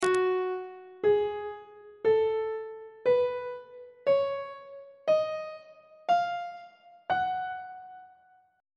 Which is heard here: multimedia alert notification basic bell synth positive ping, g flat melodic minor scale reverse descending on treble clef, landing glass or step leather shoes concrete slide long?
g flat melodic minor scale reverse descending on treble clef